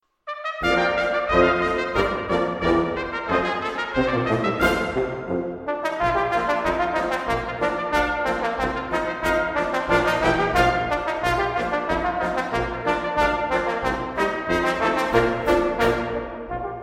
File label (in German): Posaunenpolka